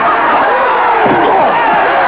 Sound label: basket; Amiga Soundtracker Sample Packs